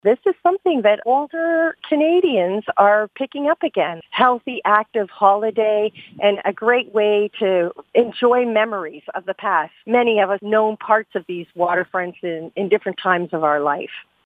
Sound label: Bike